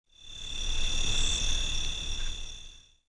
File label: CRICK, nature; PC Gamer IT CD 40; zones